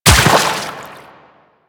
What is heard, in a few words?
Run Water, Footsteps; Warframe; Quests; The New War; Kahl